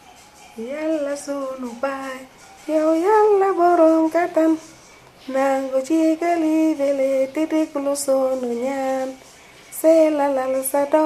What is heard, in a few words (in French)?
chant sénégalais